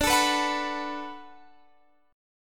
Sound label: D 6th D down Guitar Open F, chord; D6 strummed